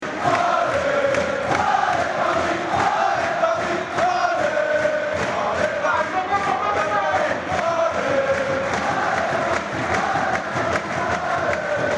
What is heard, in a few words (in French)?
Allez Paris classic, chants; football; psg